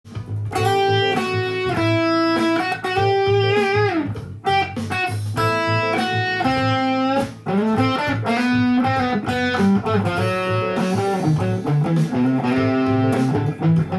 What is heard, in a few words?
a dorian solo, guitar